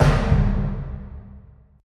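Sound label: Boss Battle Intro; Shurado; Android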